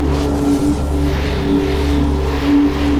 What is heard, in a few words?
summonerspell base barrier; LoL